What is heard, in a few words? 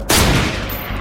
pistol shot